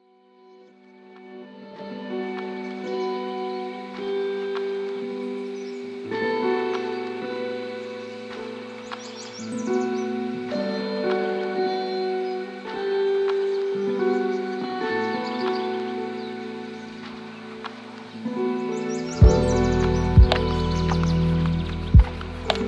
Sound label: lofi hip hop